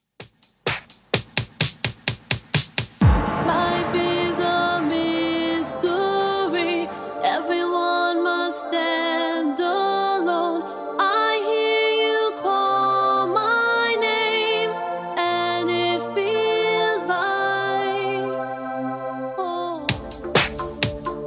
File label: House Redrum 128bpm